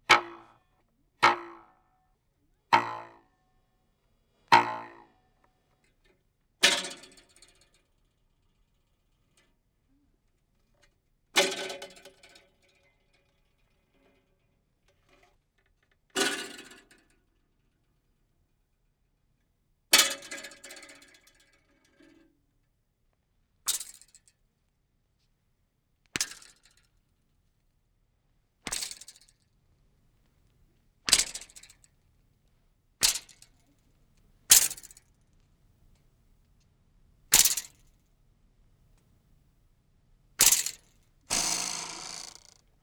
Twangs Variety of Objects Ruler Like Metallic; Foley; Props; Foley Essentials

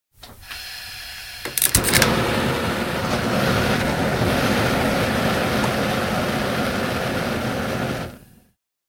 Gas Burner; 100 sound effects

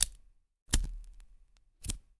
LIGHTER; 1000fx

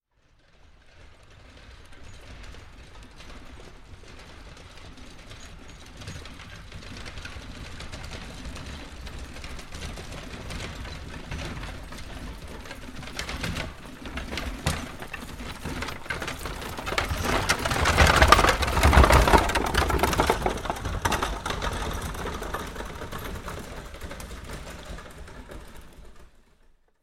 Horse Drawn Carriage By Very; Horses Carriages and Traps; Hollywood Edge Historical Series

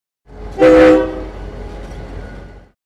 Train Horn Blow Medium Perspective; Planes & Trains

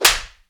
SLAP, FIGHT; 1000fx

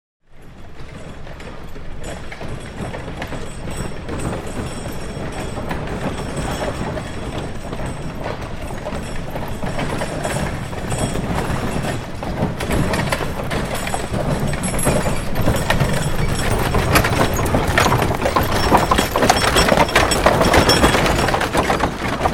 2 Wagons By Slow 2nd Wagon; Horses Carriages and Traps; Hollywood Edge Historical Series

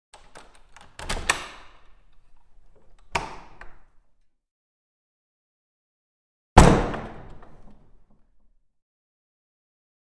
Wooden Vestry Door With Lock; Hollywood Edge Historical Series; cd4 Castles Palaces & Monasteries

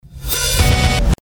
Microchop; Best Of N2O Library

